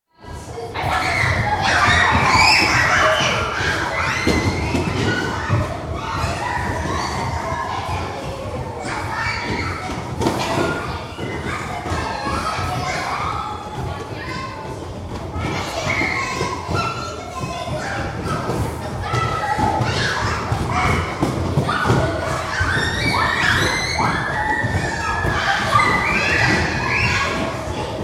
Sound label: Primary School, junior girls' gym class, organised activities with whistle, lots of running around & occasional cheering; School Sound Effects; BBC